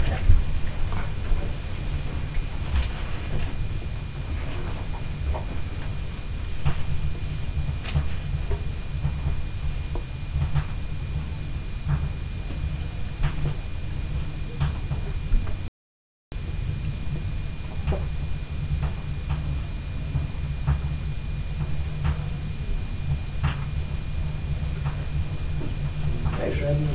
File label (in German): schnalzen, jugend; Bergheim